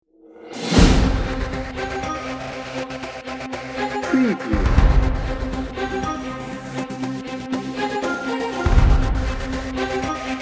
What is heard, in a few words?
warrior march